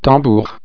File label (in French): tambour